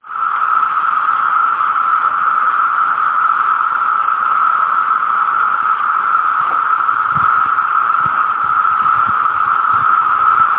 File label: cicada swarm in virginia